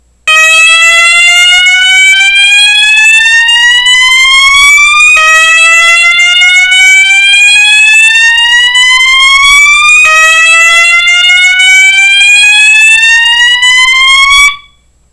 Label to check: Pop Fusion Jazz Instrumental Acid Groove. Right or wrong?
Wrong